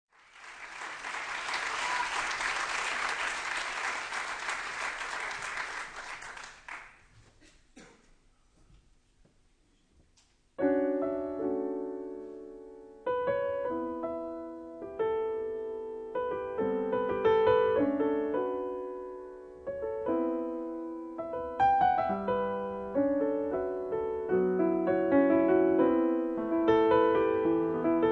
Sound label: piano; Piano improvviso n° 11